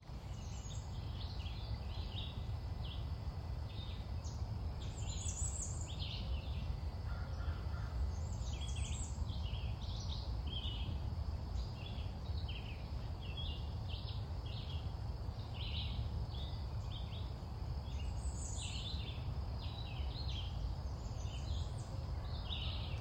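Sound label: bay breasted warbler and friends; field recordings; scout island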